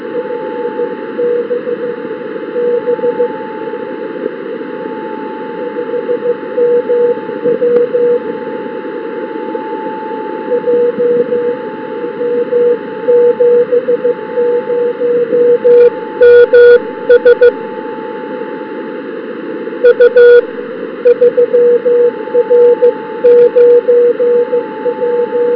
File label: Beacon; smyčce L=232 odpovídající času asi 4.47 UTC; MIB